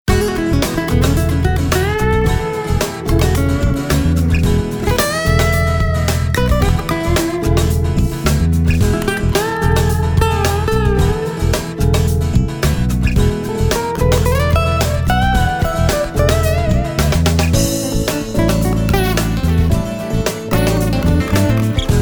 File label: Rock Summer BBQ